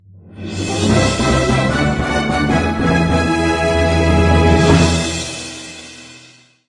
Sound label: battle win